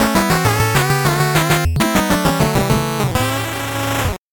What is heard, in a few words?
8bit jingle normal